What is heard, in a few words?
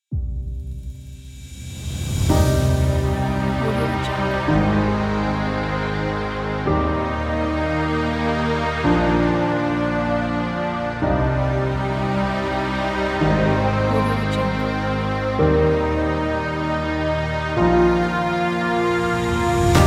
epic moment